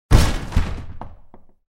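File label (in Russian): звук выбивания двери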